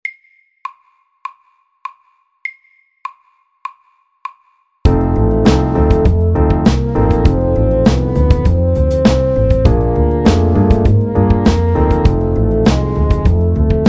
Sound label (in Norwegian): Kromatisk blues MIDDELS